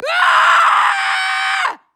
female generic warcry

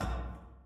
catwalk, Footsteps

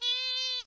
fox; Animals

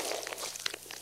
snake, Footsteps

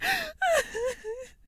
cry female, Voice Human

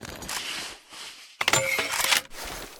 rpg reload; Weapons; Guns

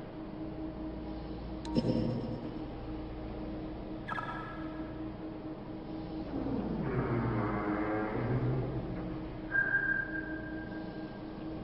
ambience

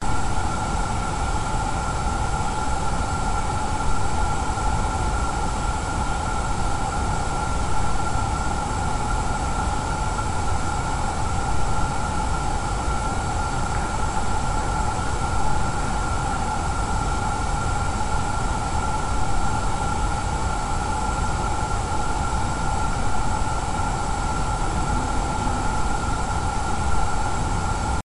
gas hiss; civ13; civ14; Ambience

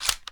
selector, Guns

